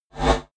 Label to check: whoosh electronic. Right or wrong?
Right